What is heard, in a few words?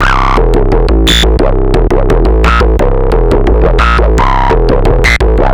Synth, Drum n Bass